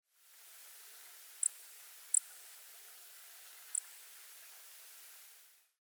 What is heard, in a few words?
Grasshopper Sparrow Ammodramus savannarum; sparrows